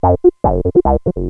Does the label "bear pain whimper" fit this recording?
No